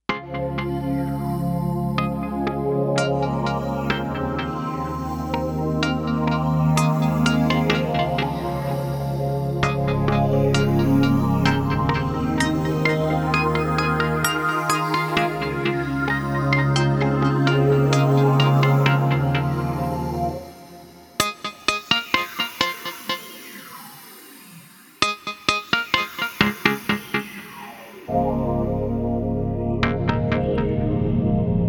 Kawai K5000S; Wavelab

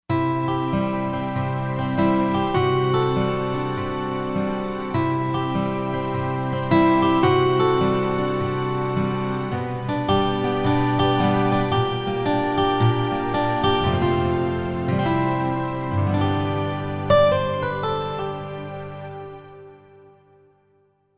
Roland Fantom XR

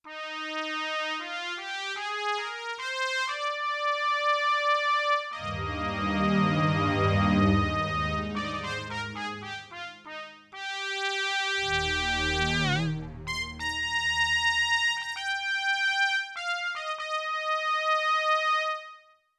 Trumpet & Low String, Yamaha TX16W

